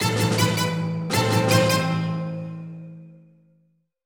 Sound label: Music Sting - Ball returned to Box; Pandora's Box